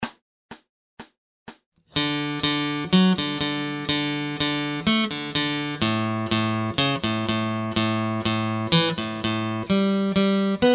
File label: Acoustic Accompaniment, acoustic guitar